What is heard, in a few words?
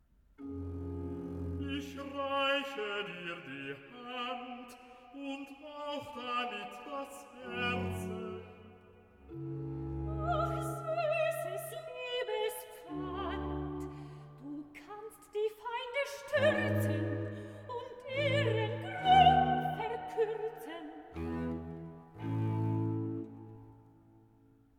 recitative